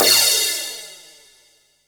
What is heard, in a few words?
crash L; Zero-G; Chemical Beats; CRASH ZOO